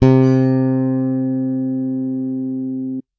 bass electronic, electric bass finger

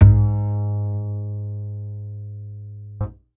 bass acoustic

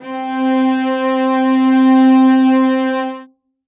string acoustic, cello

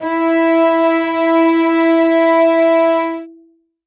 string acoustic, cello

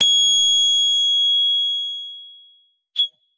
guitar electronic, distortion guitar

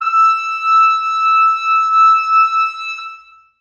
brass acoustic, muted trumpet

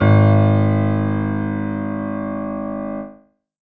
keyboard acoustic, acoustic grand piano

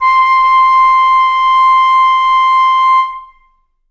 flute acoustic

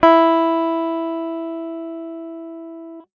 guitar electronic, clean electric guitar

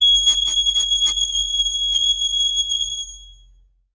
reed acoustic, clarinet

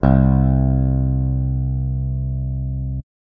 guitar electronic, clean electric guitar

